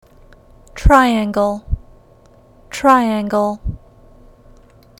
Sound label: triangle